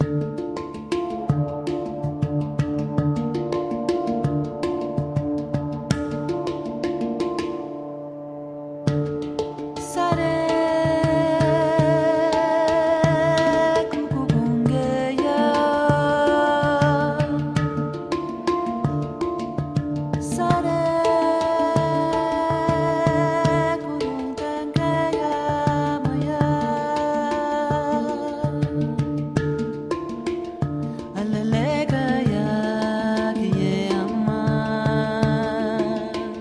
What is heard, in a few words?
hang loop